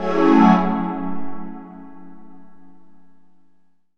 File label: C#m7, Chords; Electronisounds